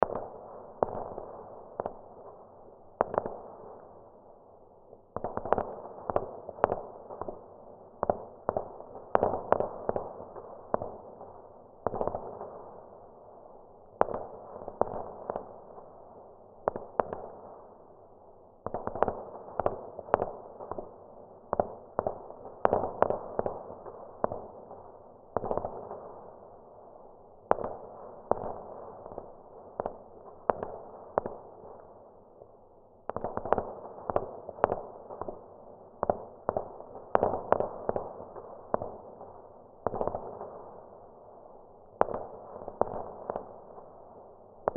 Battle Gun Shots Distant Loop; Battlefield; Warfare; Battlefields; Blastwave

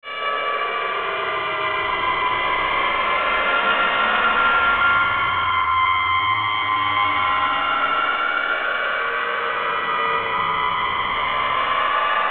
Feedbacky Drone, Mysterious Feedback Drone; Strange , Edgy Oscillating Feedback Drone , Like A 1960s British Tv Alien Monster; Sound Design

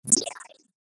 CHOPPER DIGITAL, Chopper, Short, Reverb, Clicks; Production Element, Imaging Element, Accent, Transition; Multimedia; Blastwave

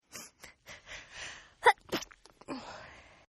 Sneeze Female Stifled; Sneeze Female Stifled 1; Sneezing Sound Effects; Human

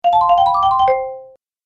Happy Game Bonus; Video Game Sound Effects

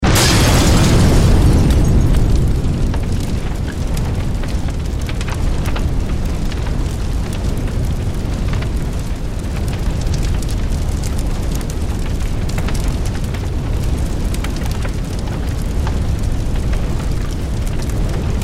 Explosion Gas Station Building Fireball Fire; Military; Warfare; Blastwave

